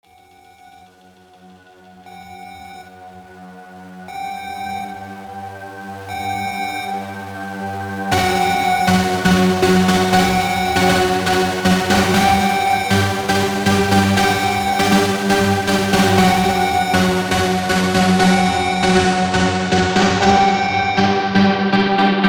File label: Siren superbass reverb slow